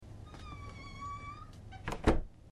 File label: creaky door closes